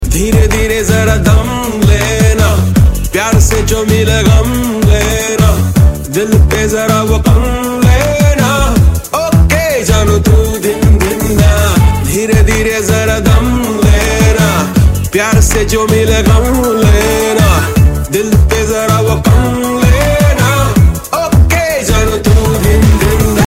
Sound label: Title Song Ringtone